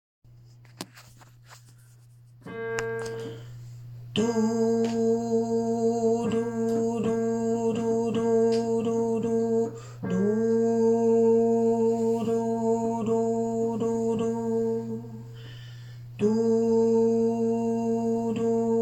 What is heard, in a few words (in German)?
tenor a and b2